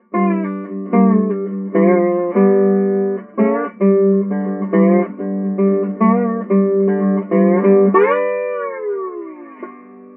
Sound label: dobro banjo mic